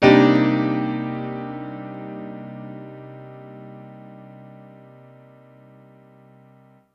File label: Piano stack (C) min (jazz); Sonic Foundry (Sony Creative Software); Ugly Remnants VOL-2